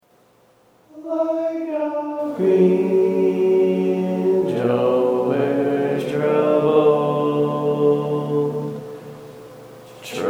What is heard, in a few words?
Bass, barbershop